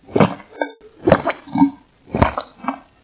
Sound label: drinking female